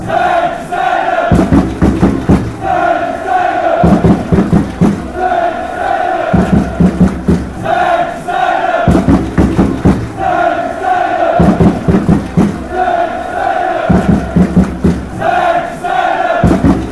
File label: chant, fanchants; club brugge